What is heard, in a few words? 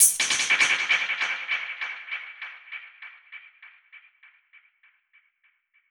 Perc Hit, dub percussion one shots; musicradar